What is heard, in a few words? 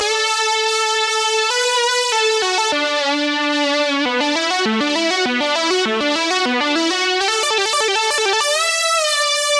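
D major Phrase Keytar Lead; Zero-G; Future Wave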